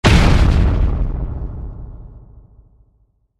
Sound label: tnt; tntbomb